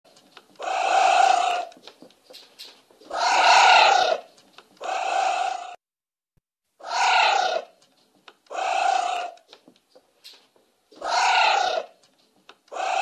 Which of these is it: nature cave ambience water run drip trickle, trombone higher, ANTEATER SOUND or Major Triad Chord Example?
ANTEATER SOUND